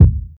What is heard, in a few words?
hot bass drum sample e key, kickdrum tuned to the E note; kick drum samples